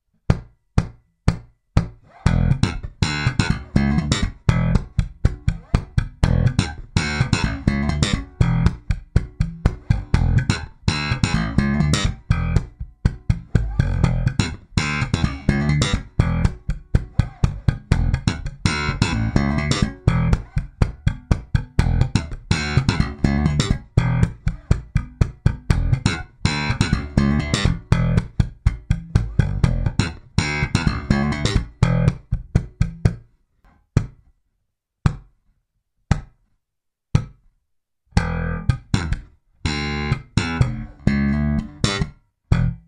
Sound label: Slap groove in E